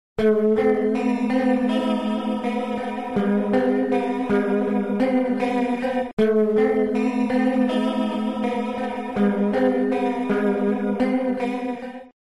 Stereo Flanger, Shaker Flanger, Guitar Wet; Sonicircuit; Syntheway

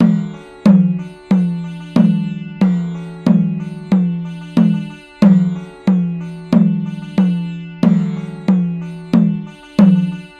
Indian Khol Mrdanga; Drumwavy; Syntheway

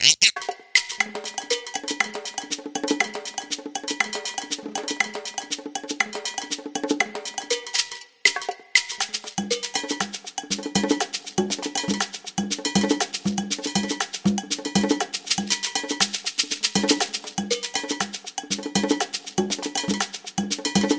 Latin American Percussion Set; Drumwavy; Syntheway

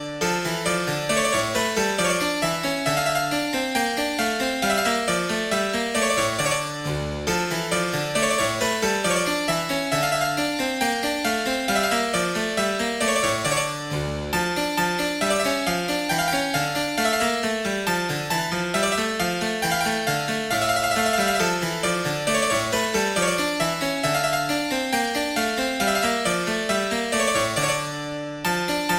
Flemish Harpsichord; RetroMagix; Syntheway

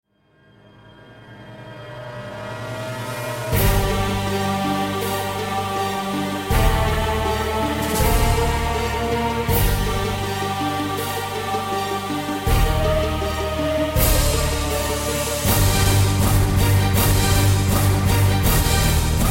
Strings, Choir; Magnus Choir; Syntheway

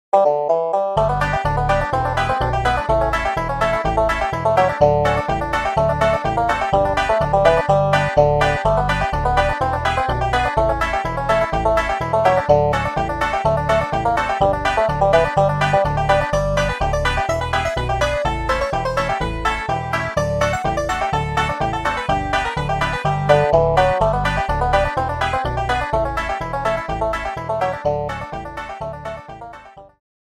Traditional African-American, Banjo; VSTi; Banjodoline; Syntheway

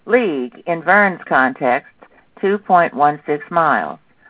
airpods pro; bluetooth

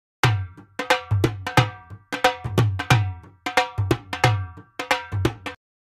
Dhol

